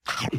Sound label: eat